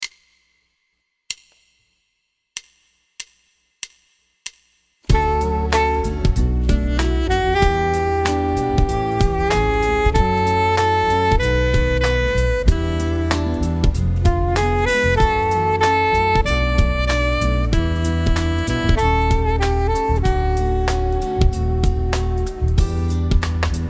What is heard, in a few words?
Technicolor Playalong